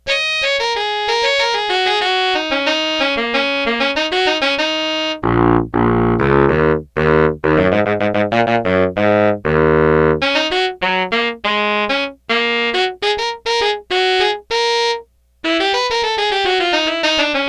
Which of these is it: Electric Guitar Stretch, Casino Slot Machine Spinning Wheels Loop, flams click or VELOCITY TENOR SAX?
VELOCITY TENOR SAX